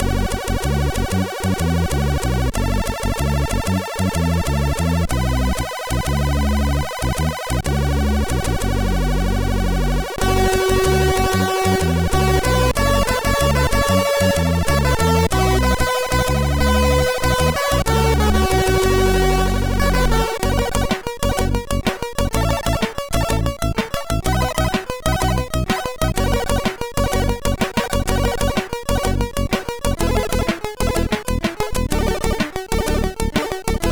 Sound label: tune sid MOS6581R4; Underground 08 tune 1